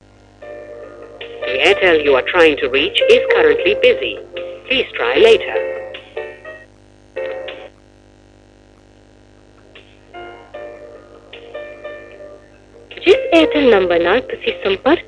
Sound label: busy ring